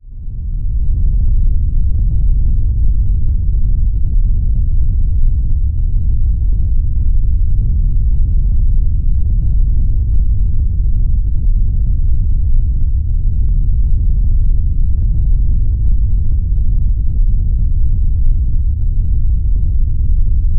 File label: sound design earthquake rumble